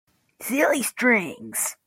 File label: silly strings